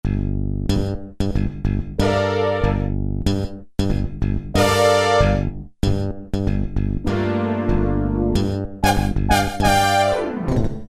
Slap Bass n Brass; Factory preset demos

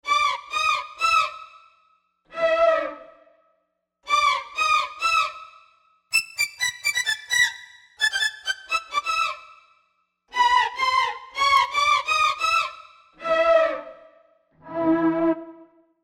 Disco Str Hit

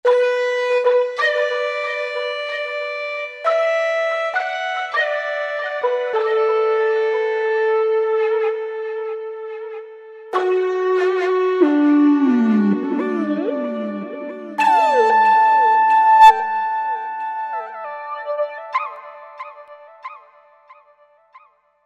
Big Bamboo, Roland V-Synth; Factory Patches

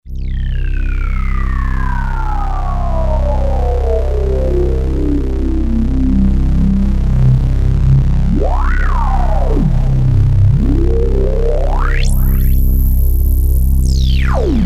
CS-Eighty Sweep, Roland JD-990; Vintage Board

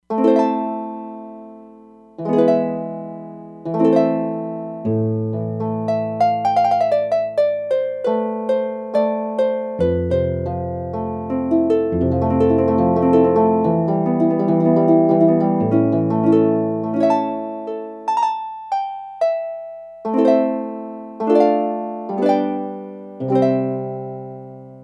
Troubadour, E-mu Proteus-3; Factory patches

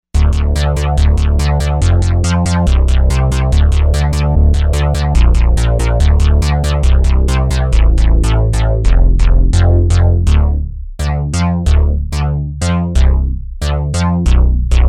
Britelow Bass

